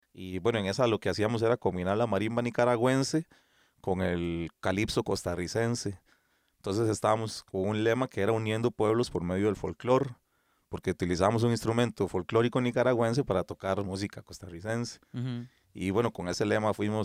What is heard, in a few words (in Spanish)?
combinacion de folklor